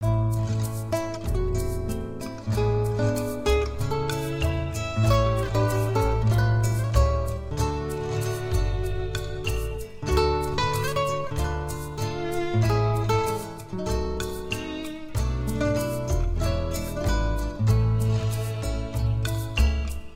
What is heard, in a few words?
Smarthome Romantic; Deus Ex Mankind Divided